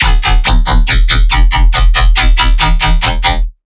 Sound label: Riddim Bass Hit E; Lorg